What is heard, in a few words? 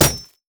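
siege rider basic attack; minions; LoL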